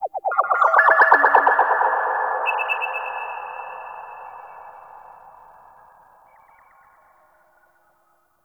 Mine Drone Underwater Idle Vocal; Warframe; Grineer

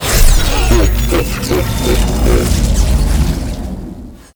Shrine Assemble Ghost Spawn; Warframe; Duviri Murmur

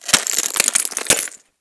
Ocean Glass Crack Small; Warframe; Grineer Ocean

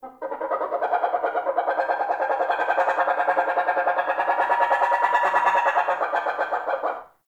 Myna Bird; Warframe; The New War; Zariman; Apartment; Scene Six

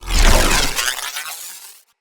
Voltaic Sword Activate; Characters; Enemy; Abilities; Sinecure; Banneret

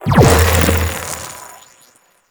Deactivate; Warframe; Captain Vor; Nervo

